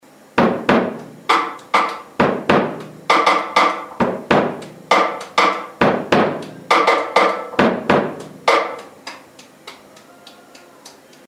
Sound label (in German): Drums kurz